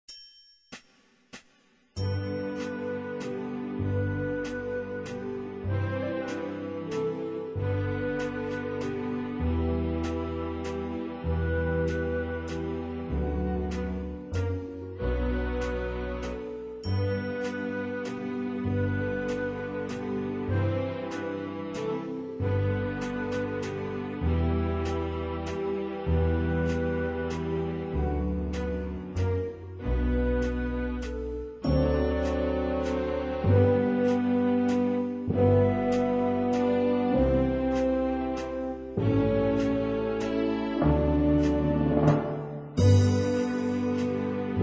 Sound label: Waltz